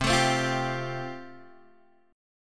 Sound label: F Major Add 9th C, Guitar Standard; Fadd9/C strummed; JGuitar